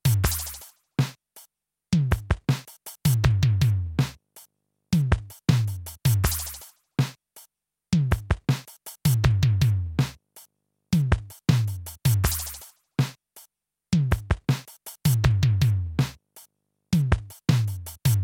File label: dmx pitch mod; bendexamples